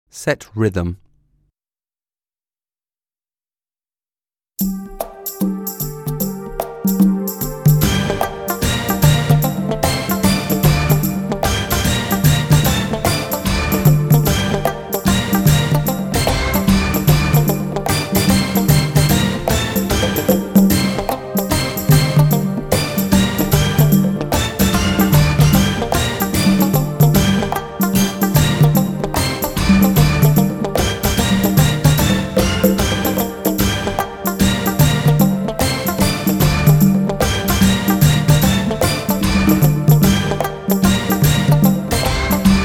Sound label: Set Rhythm; Ballet